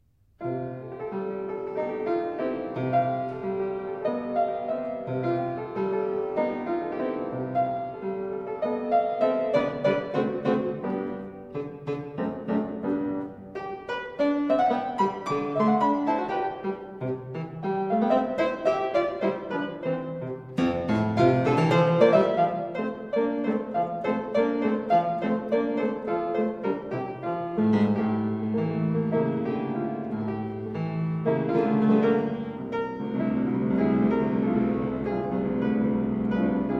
Bagatelles for Solo Fortepiano op 119 no 7; Ludwig van Beethoven

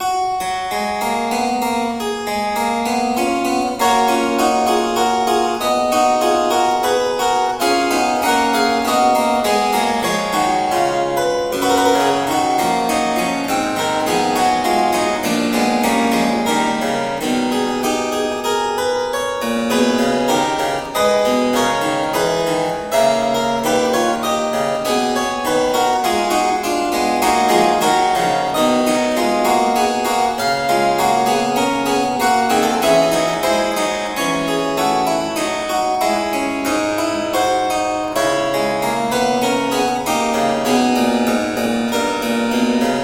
chorale prelude for organ; Kyrie Gott Vater in Ewigkeit (II); BWV 672; Selections from JS Bach ClavierUbung III; Johann Sebastian Bach

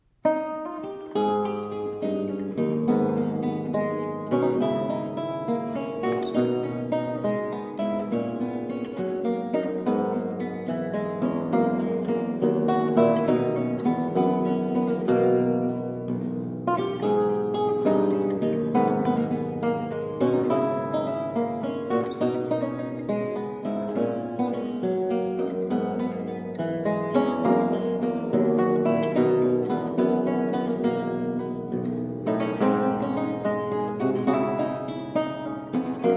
Suite in a Minor Gigue, Lute; Count Ferdinand Graf von Bergen

